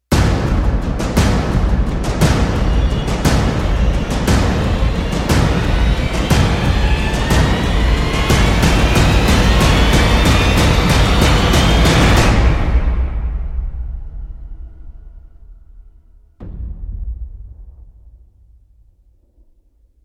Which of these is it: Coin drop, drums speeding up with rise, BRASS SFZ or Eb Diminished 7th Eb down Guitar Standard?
drums speeding up with rise